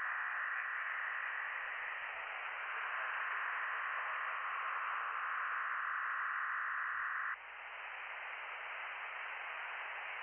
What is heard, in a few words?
from radio, 7177KHz